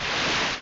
Swim

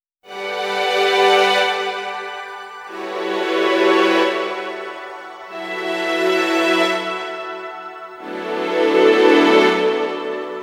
bridge - painful type b, piano

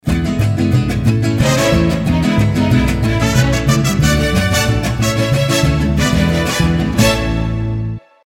Mariachi; ShellShock Live

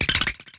down test

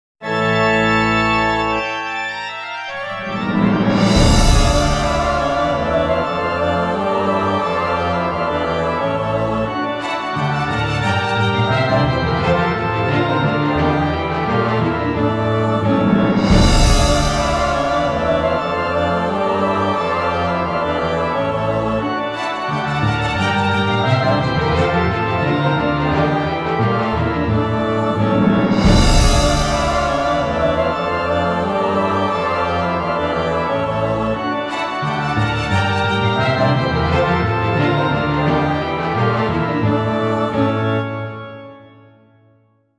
Pipe Organ; pachislot akumajo dracula iii; God's Will

